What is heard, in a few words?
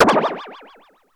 Giant Goomba